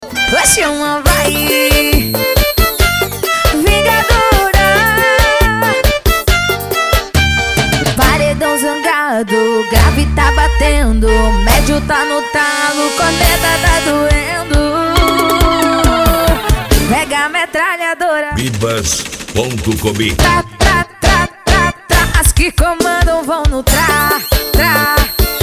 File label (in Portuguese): sound car carnaval